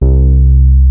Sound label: Sub Sonic Bass C2; E-MU Formula 4000 Series Vol. 1; Hip Hop Nation